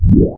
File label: low whoosh